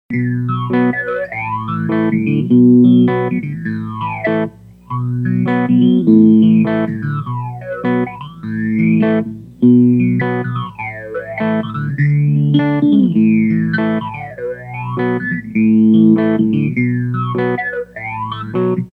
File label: Tap Swing, toggle